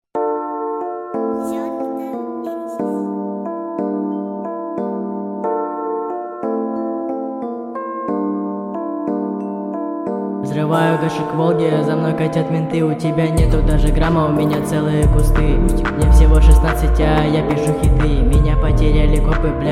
slowed reverb

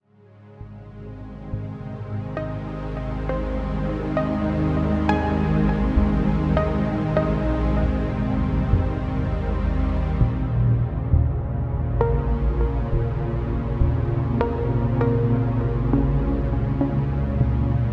Instrumental Relaxation

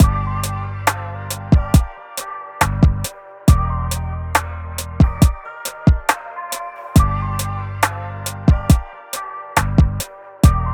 Lofi Beat

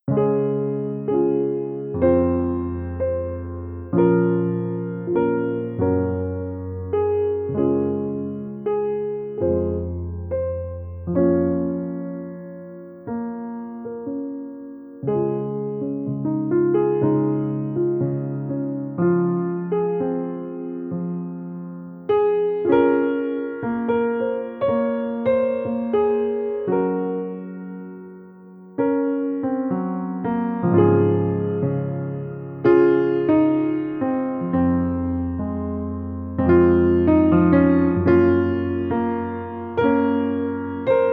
Solo Piano

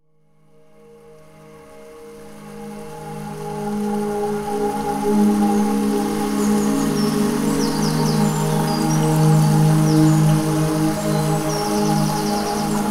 Variety Rain

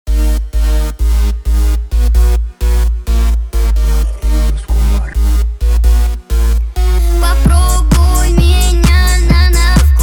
Low Bass, 52-58hz

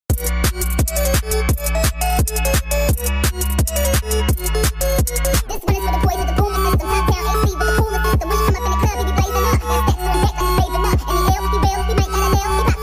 sped up

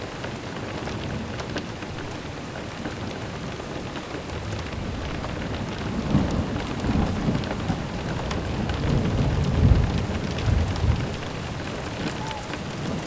White Noise Gushing Winds

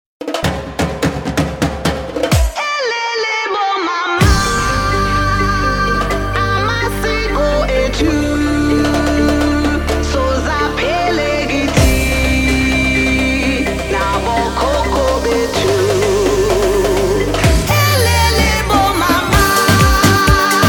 Tribal Pop Mix

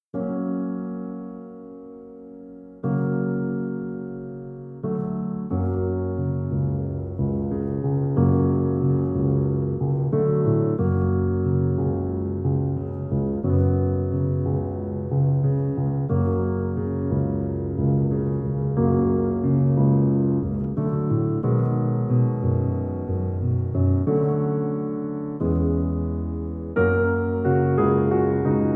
Piano Bar Chillout Lounge

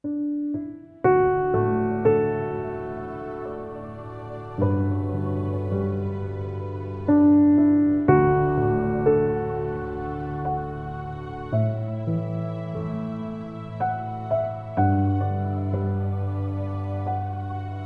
Piano

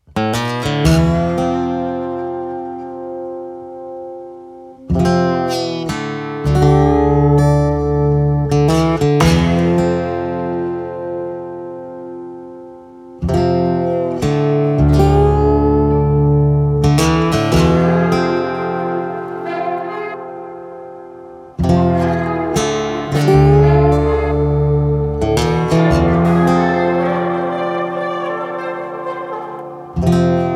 Birdsong

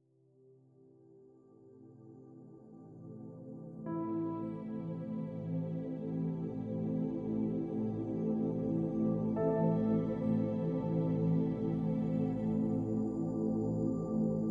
Relaxing Music, Spa Music

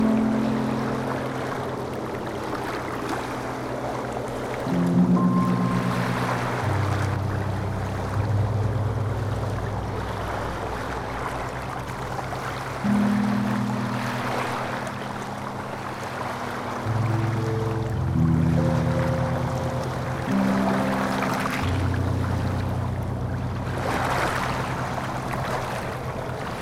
Calm Sea Sounds

